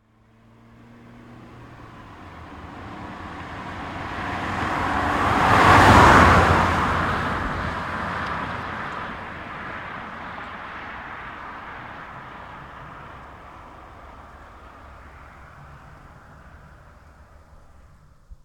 Toyota Corolla Verso 1.6 exterior passby fast whoosh; mono; Soundholder; Cars In Motion II